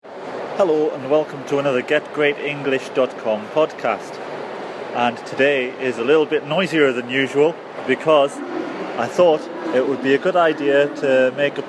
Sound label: background noise